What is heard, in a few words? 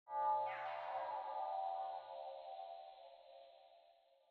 ambience room necromastery magic layer